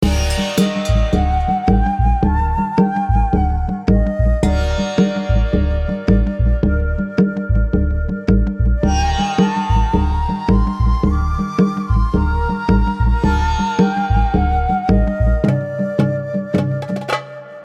freespin melody; Book Of Lords

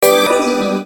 bonus chance; Book Of Fortune

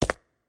reels start; Corrida Romance; Wazdan

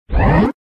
UI AUTO START; games; Amazing Fruits

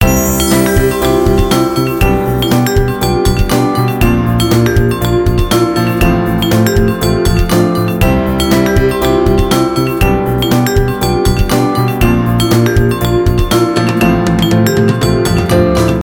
free spin melody; Bells On Fire Rombo

